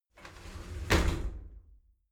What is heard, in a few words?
shower slide doors close regular; objekte; tueren